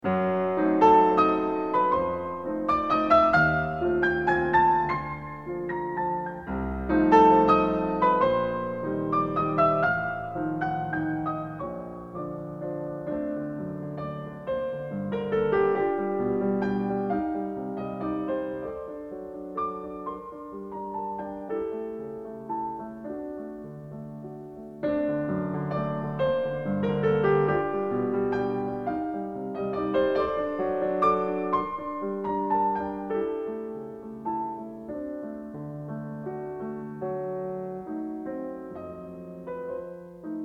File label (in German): Carnaval für Klavier, Valse nobl; Wilhelm Kempff; Op 9